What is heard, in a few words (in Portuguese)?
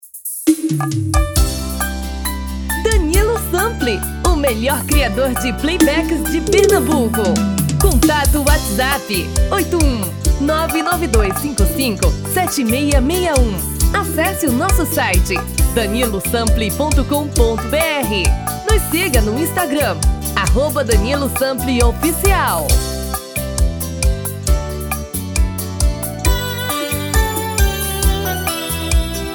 tom feminino